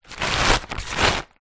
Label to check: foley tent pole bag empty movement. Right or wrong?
Right